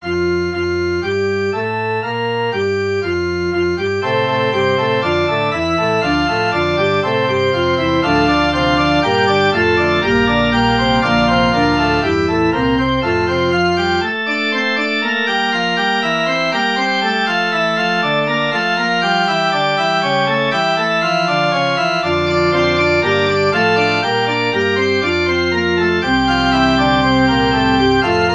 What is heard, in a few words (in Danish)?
forspil; ddk 154 glden hun er fdt idag